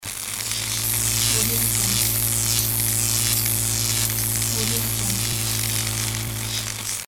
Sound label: electric spark